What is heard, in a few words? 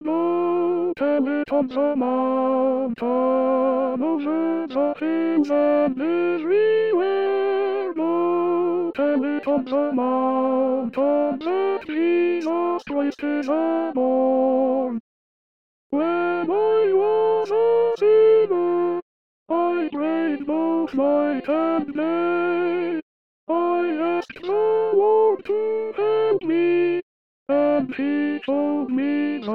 basses